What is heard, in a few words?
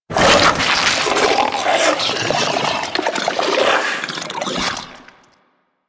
Tendrils Pull; Warframe; Warframes